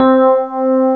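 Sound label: C4 electric piano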